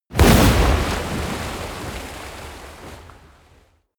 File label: explosion medium water, comedy cartoon; soundpool